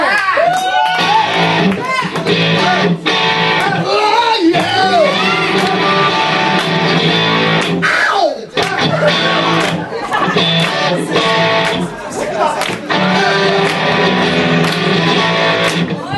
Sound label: tube driver sound